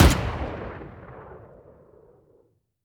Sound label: Rifle Shot; Unity 2D Game